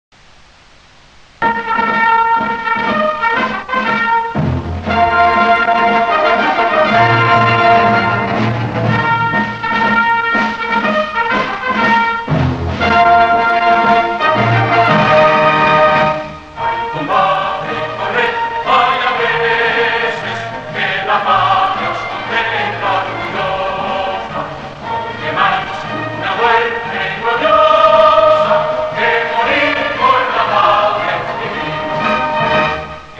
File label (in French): Hymne National de Cuba